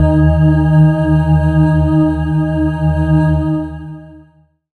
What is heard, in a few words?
Bell Vox, Pads; Zero-G Jungle Warfare 1, 2 & 3; 90 s Sample CDs